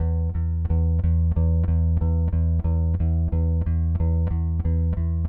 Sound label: Rock, Bass; Bass X